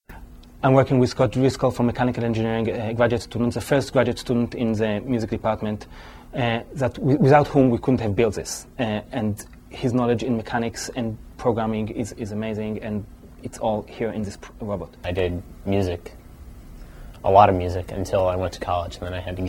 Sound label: percussion robot